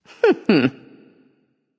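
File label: heh heh, female; smite; voicepack; hera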